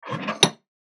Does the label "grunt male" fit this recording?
No